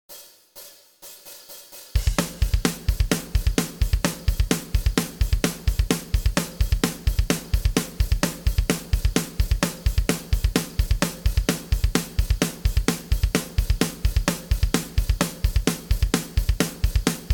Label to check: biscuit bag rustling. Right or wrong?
Wrong